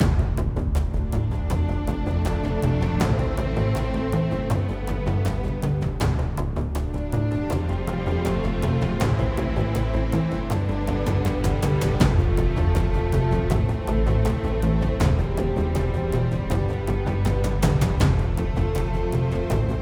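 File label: Music Orchestral, Orchestral Loading 80bpm; Forza Motorsport 6 Apex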